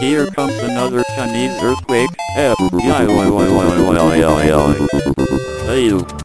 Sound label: Egg Chant Chinese